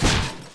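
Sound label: jump land; Crash Bandicoot The Wrath Of Cortex; XBOX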